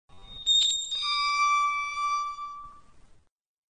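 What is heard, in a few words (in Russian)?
скрип ворот; Skrip vorot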